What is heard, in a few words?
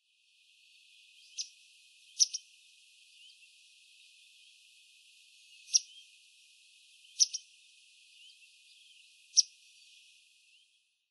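White Wagtail; hitachi; koe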